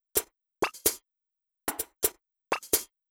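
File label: Drum Loop 128BPM hi-hat Fill; VEC5 Drumloops; VEC5 Multi Loops; Loop Elements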